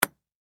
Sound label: Ping Pong Ball Hit Single; Sports